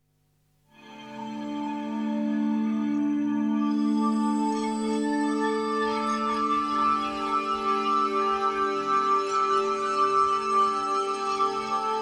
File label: iridium morpheus delayed chorus